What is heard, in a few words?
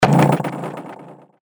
Overdrive, percussion; revealed percussion vol 2